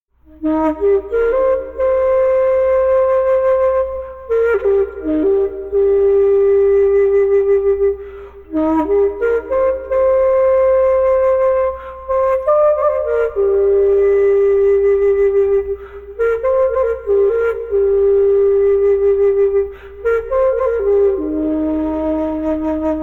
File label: old man flute